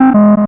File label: Error; DKC2